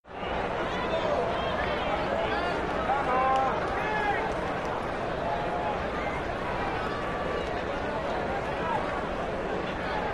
Arena Crowd; Crowd, Steady Idle And Reaction 'ohhs'.